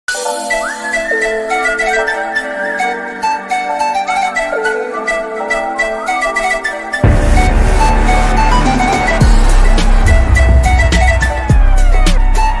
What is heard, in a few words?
love bgm ringtone tamil ringtone love bgm ringtone flute ringtone